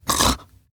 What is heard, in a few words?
Snores, Grandmother, Vocalizations; Warframe; Deimos